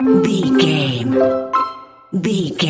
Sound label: happy collect item